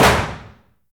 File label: glock shot